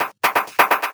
Fill Ins 128BPM; Vengeance Samples

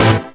Synth Sound; Vengeance Samples; VEE Synth Shots

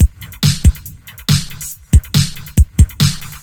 Loops BB 140, BreakBeats; Vengeance Samples

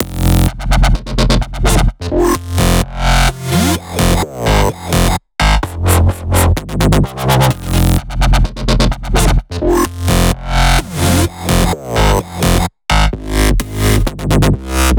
Spring Melody Root F, 128BPM; Vengeance Samples; Spring Kit

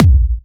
Bassdrums UK Trance Kicks; Vengeance Samples

